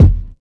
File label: tight lows steel kick drum D# key, Royality free bass drum single hit